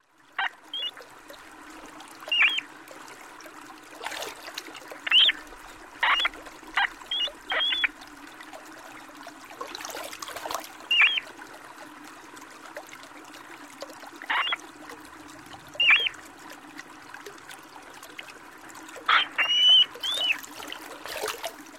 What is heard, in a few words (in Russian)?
ondatra golos